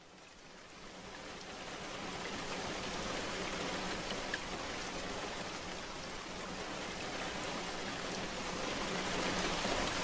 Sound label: Torrential Rain Nature Sounds; Music; Soundscapes